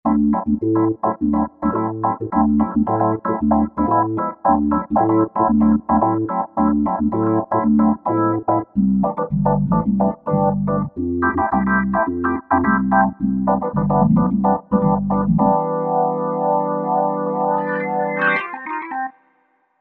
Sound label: Sticky Organ, Roland V-Synth; Factory Patches